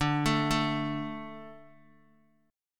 D 5th D, down, Guitar; D5 arpeggiated; JGuitar

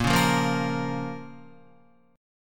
Bb Minor, Guitar; strummed; JGuitar

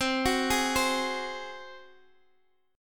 Gb Suspended 2nd Flat 5th C

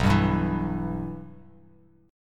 B Suspended 4th Sharp 5th B Bass Standard; Bsus4#5 strummed; JGuitar

